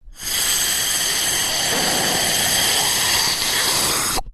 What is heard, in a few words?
long suction